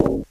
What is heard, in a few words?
glass, footsteps, survivor, run; player; source engine; left 4 dead 2